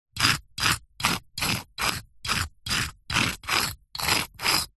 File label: stone small scrapes various on rock or brick; foley